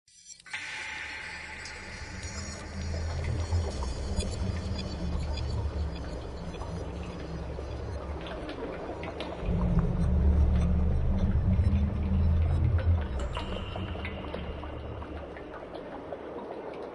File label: sewers; Submachine 2